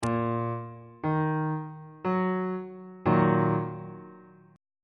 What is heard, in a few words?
e flat minor 2nd inversion on bass clef